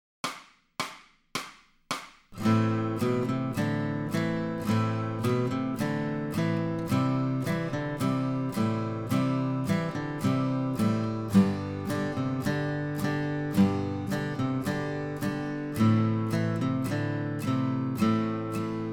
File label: acoustic guitar, accompaniment; Grade 1